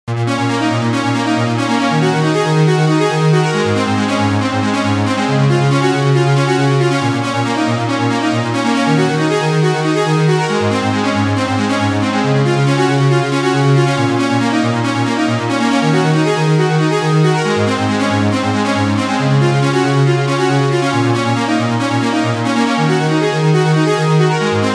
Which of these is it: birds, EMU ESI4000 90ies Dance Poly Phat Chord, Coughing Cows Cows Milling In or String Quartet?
EMU ESI4000 90ies Dance Poly Phat Chord